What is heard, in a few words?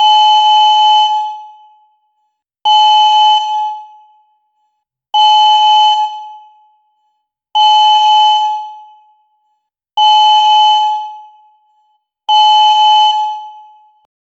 introduction tone of a, alert tone